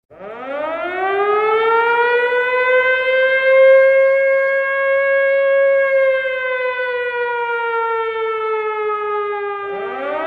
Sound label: air raid siren sound